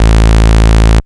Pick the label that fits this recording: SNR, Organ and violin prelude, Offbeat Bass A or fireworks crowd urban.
Offbeat Bass A